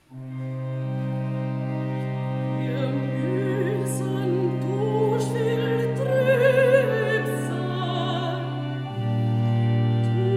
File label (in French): recitatif alto, bach; bwv12